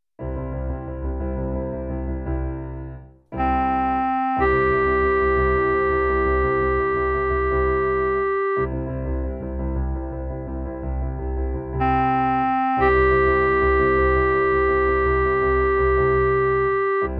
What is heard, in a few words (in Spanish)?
CLARINETE